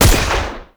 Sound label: Pistol Fire; Warframe; Grineer; Orokin